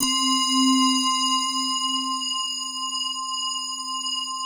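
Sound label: PAD, atmospheric pads; Best Service; XX Large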